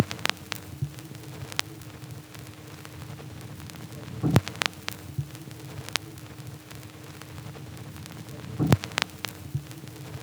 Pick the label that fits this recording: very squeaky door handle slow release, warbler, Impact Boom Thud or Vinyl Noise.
Vinyl Noise